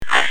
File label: attack hit